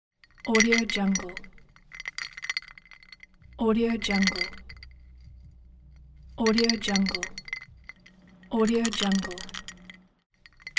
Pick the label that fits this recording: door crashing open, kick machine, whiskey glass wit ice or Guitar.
whiskey glass wit ice